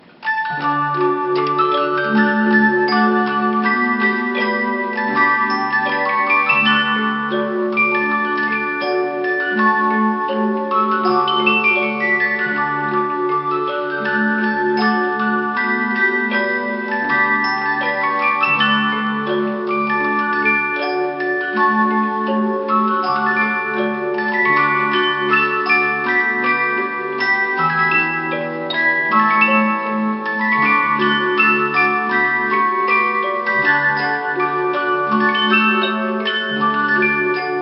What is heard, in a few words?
music box chimes; chime collector